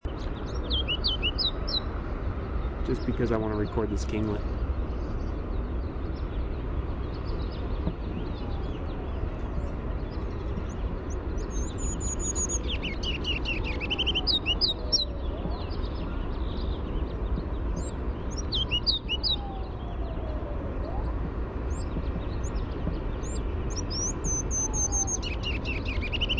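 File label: song, ruby-crowned kinglet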